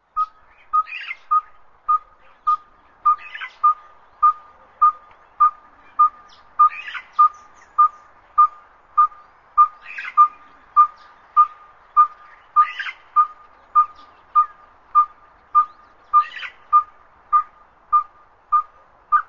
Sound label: Yellow-Fronted Tinkerbird